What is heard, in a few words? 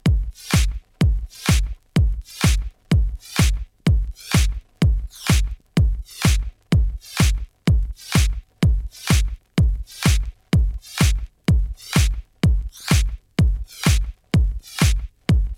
Vocal Remix